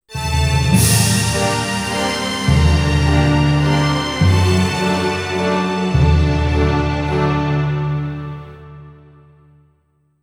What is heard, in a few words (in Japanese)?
クラシック; Devil Summoner Soul Hackers